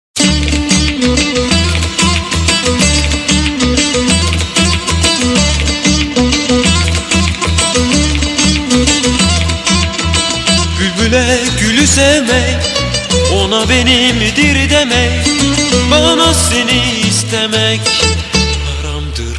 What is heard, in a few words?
anatolian rock